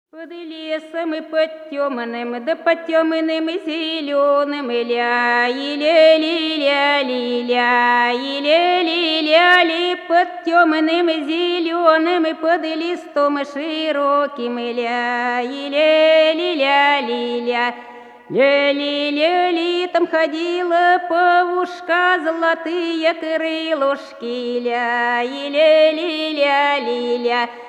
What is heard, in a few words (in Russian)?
Под лесом под тёмным, под темным зелёным (пляс)